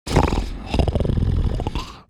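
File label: dragon ambient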